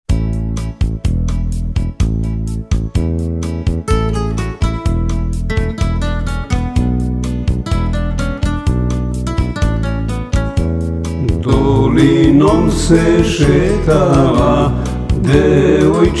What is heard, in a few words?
starogradske folk musik; Dolinom se setala